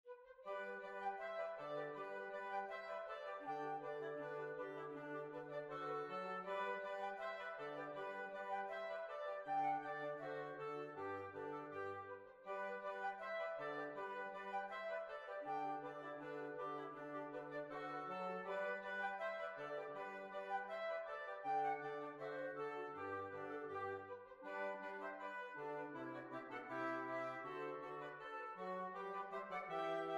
Holywell Hornpipe

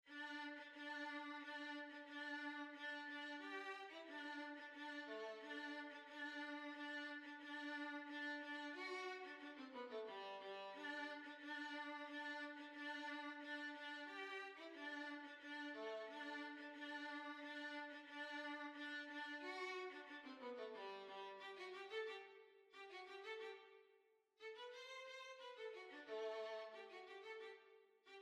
Violin

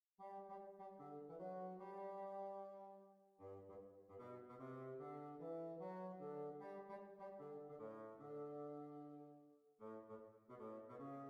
Kirkgate Hornpipe

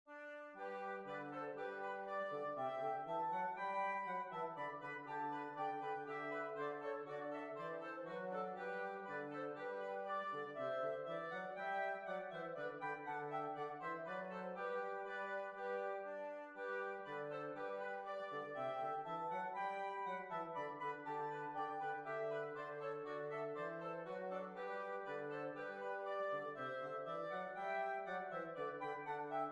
Worcestershire Hornpipe, big band

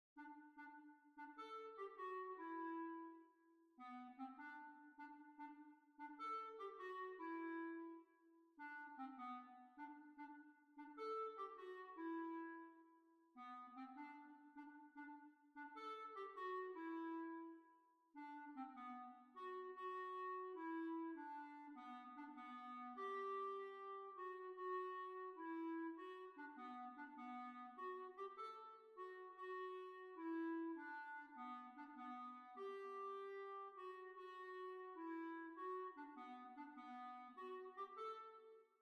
Jig

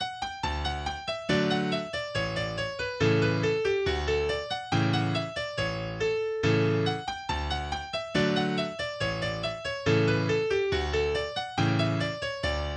Malthouse Hornpipe, english dance tunes; eighty english dance tunes

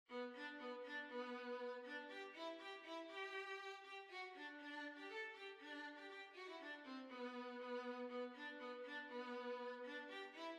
Skotsk, Violin

